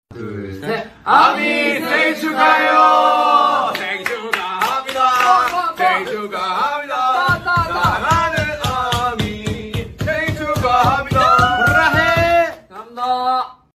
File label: singing happy birthday; army